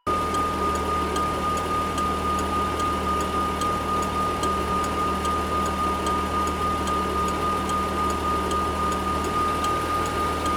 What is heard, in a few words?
Parked Car Idle Loop; Warframe; Ambience; Nineteen Ninety Nine